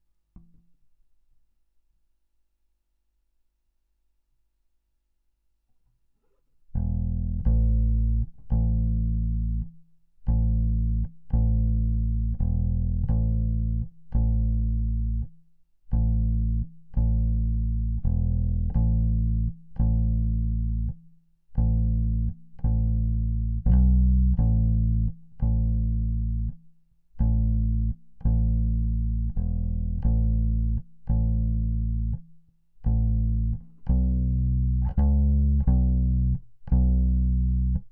Hi-Fi DI

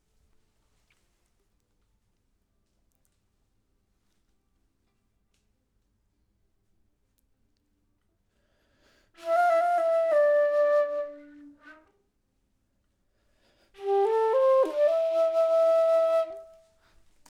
flute

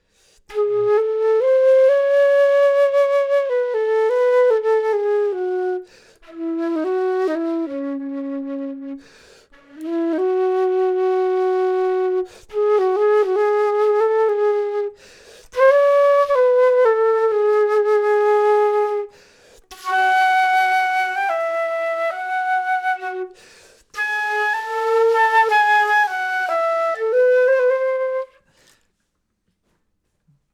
flute; logic

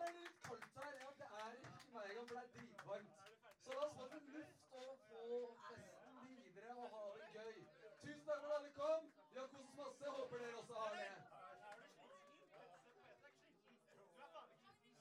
snare

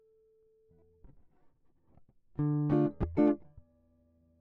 Small Bright Combo; logic

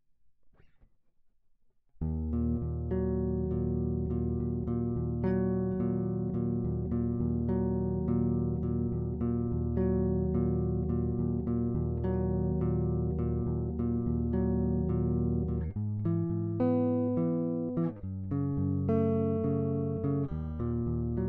Multi-Phase Amp; logic

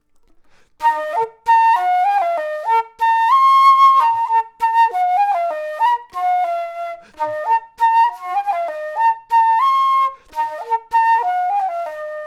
flute

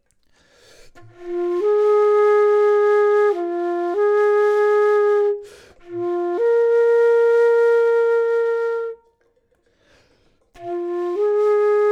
flute; logic

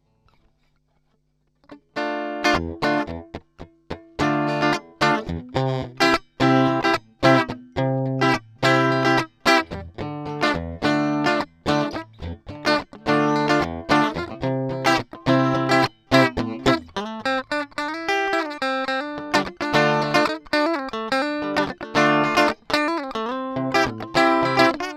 Amp

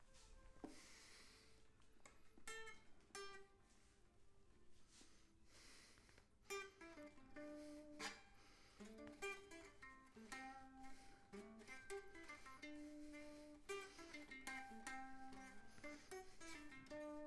flute; logic

